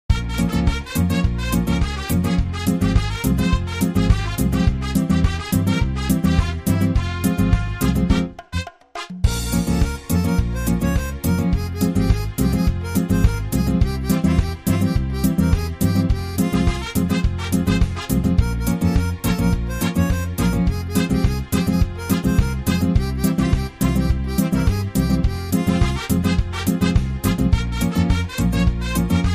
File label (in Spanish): Rumba flamenca, midi